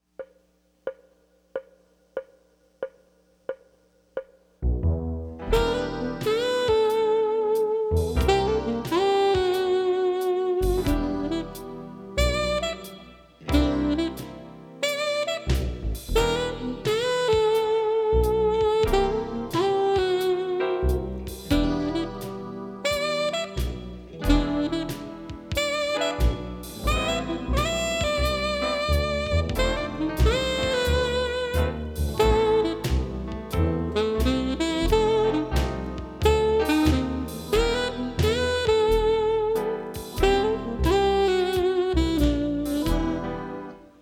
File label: Funky Blues